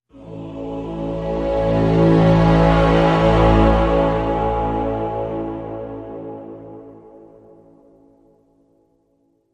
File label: Epic Growth A Major